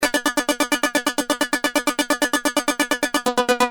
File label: Keys, Industrial